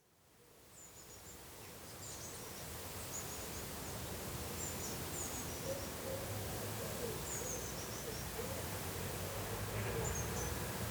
little hood garden with trees moving, birds chirping and distant traffic